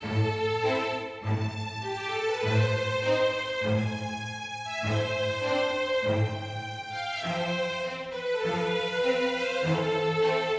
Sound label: mysterious forte